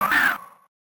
Battle throw; Pokemon Essentials; PKMN Estelar 1.3

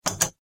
kacha; easyrpg; WitchsHeart

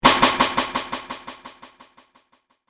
surprising shock; easyrpg; WitchsHeart

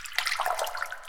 blood; easyrpg; Ib

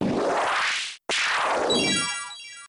Weatherball; Pokemon Essentials; Pokemon Iberia V1.03

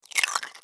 egg break; easyrpg; WitchsHeart

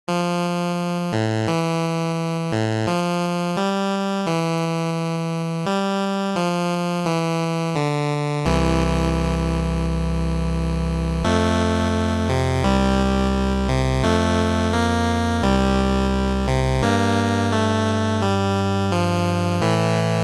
Game Over; Pokemon Iberia V1.03; Pokemon Essentials

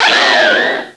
Cry; Pokemon Essentials; Pokemon Iberia V1.03

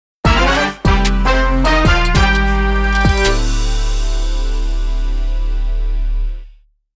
Jingle - Land a catch

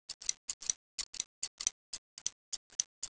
scissors; easyrpg; WitchsHeart

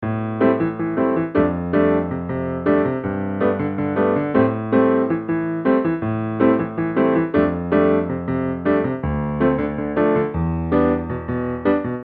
piano; easyrpg; WitchsHeart

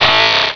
Cry

